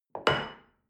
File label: shell upside down place on table hard; soundpool